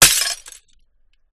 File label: Glass Shards Smash Hard Impact Few Shards Glass Grinding; Skyes Audio